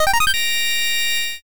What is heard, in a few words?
levelup big